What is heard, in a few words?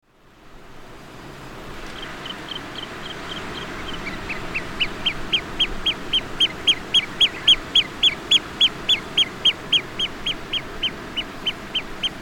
pearl kite